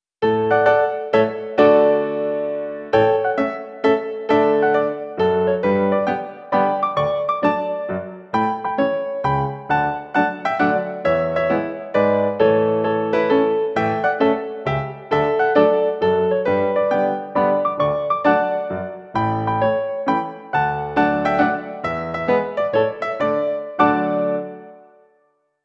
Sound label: Mazurka; Delibes